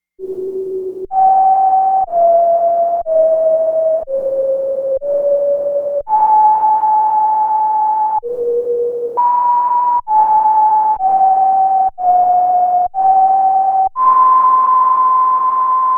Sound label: noise